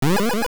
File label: Turtle Land; landing; Veran [Turtle form] landing; Zelda Oracle